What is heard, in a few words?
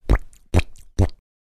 cartoon walking